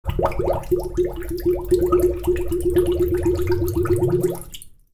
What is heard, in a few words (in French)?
bubulle